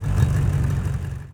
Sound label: engines; World of Tanks